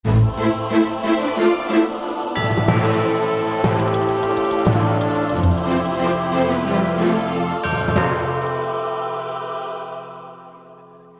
At Church Bgm Ringtone; Vinnaithandi Varuvaya

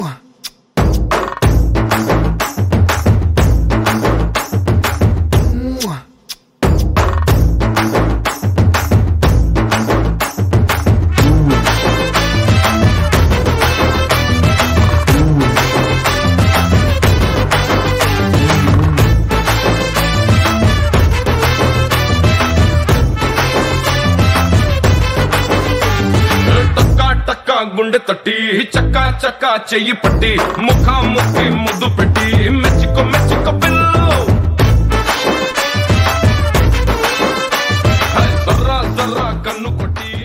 Drum Beats Ringtone

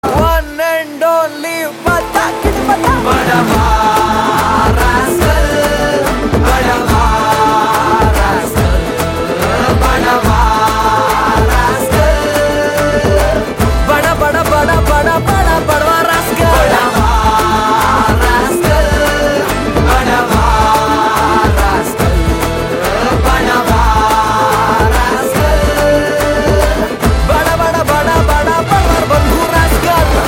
Theme Music Ringtone; Badava Rascal

